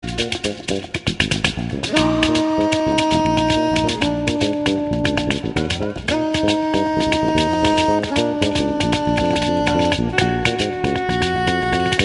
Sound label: Jazz